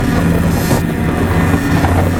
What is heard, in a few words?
desperate power hit loop; ryze